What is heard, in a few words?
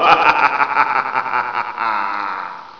evil laugh; halflife